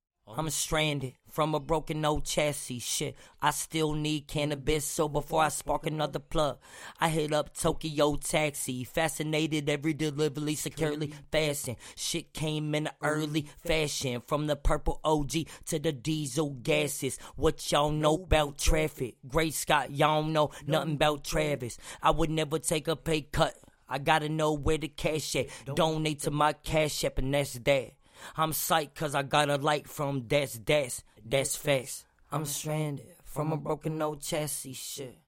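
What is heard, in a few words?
hip hop 90 E minor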